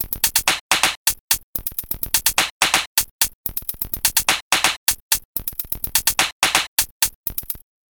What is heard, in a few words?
The loop slices in isolation.; The loop gets chopped to create a new groove.; Logic